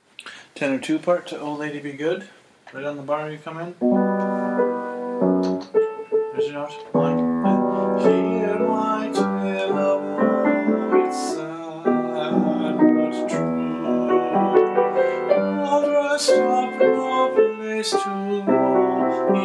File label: tenor